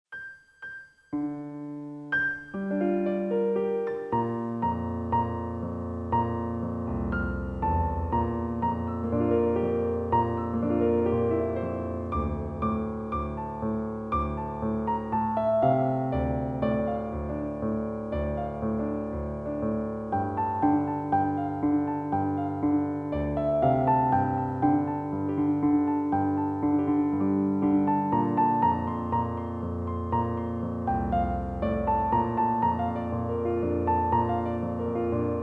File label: Piano